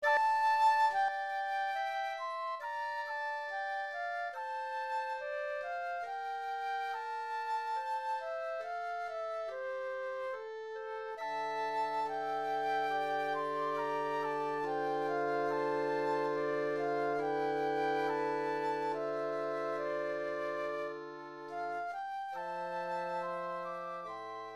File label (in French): 2 flutes clarinette basson; Ravel